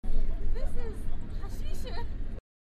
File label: loop